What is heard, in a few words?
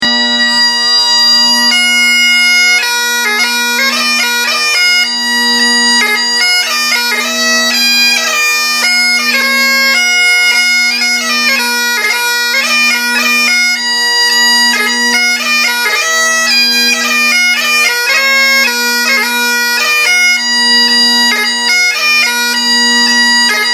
Great Highland Pipes; bagpipes